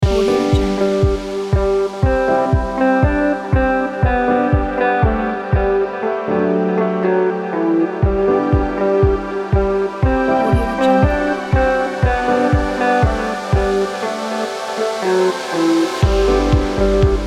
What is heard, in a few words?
happy holiday